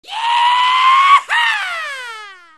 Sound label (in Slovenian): VRISK YEEEEHAAAA; Efekti